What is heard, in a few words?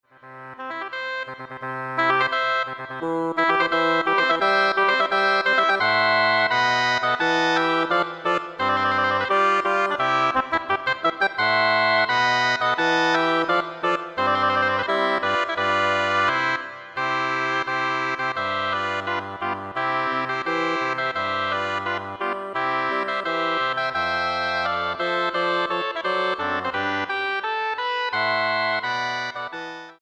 Double Reed Ensemble Mendelssohn Wedding March